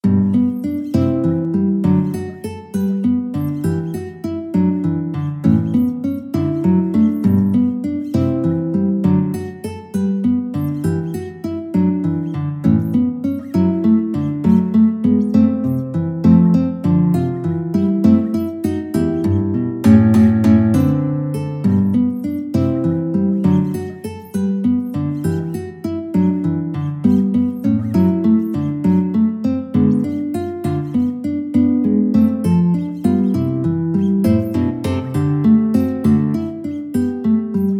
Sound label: guitar